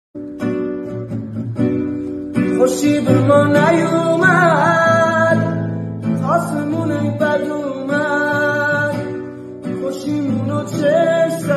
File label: guitar